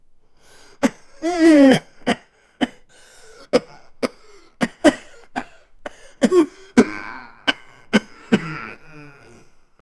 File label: old man groaning in sligh, knee